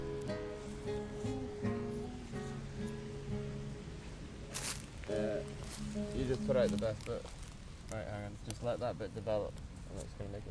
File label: bonfire